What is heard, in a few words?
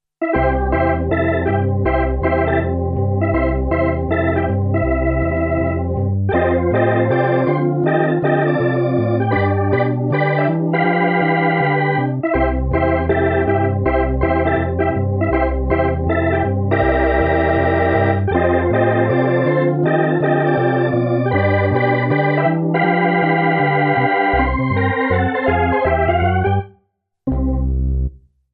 Organ